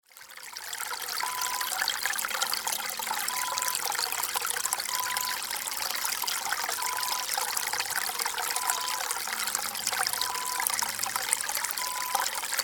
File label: Babbling Brook Stream